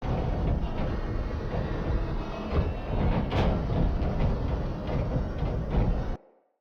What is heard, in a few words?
Wall Gate Loop; Movers